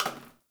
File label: Janitor Plastic Spray Bottle; Ambience; Gameplay; Warframe; Nineteen Ninety Nine